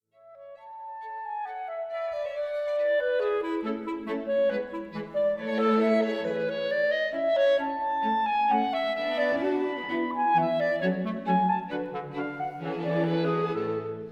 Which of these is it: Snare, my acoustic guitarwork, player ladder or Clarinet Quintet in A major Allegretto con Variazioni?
Clarinet Quintet in A major Allegretto con Variazioni